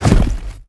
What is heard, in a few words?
Tongue Grab Pull Back; Evolve; Behemoth